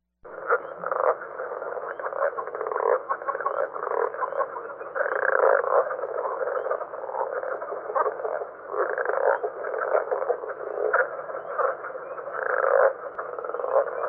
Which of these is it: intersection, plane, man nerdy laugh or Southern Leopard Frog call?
Southern Leopard Frog call